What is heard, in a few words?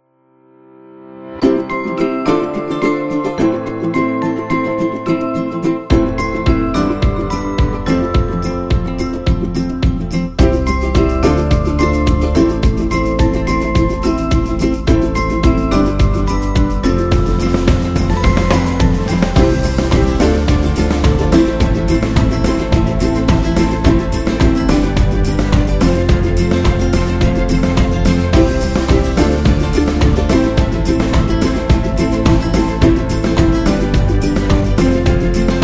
Happy Birthday Music